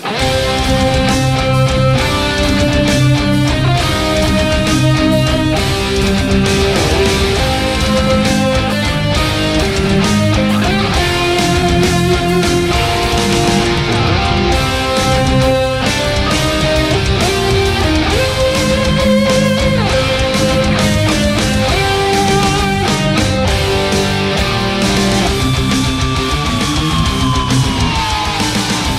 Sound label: Metal, ringtone; Rogue One